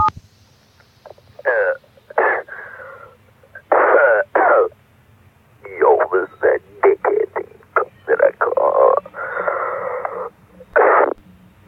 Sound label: coughing